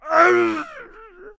Death Vocals; Ballista

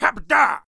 Knight Attack Vocals

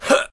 Archer Death Vocals

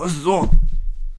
Monk Attack Vocals

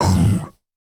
Minotaur Attack Vocals

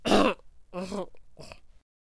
Void Cultist Death Vocals

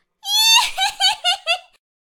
Pumpkin Catapult Attack Vocals

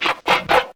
Raptor Attack Vocals

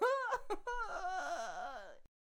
Peasant Death Vocals

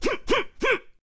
Boxer Attack Vocals

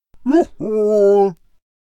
Zeus Attack Vocals

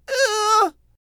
Cactus Attack Vocals

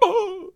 Healer Attack Vocals

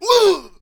Death Vocals

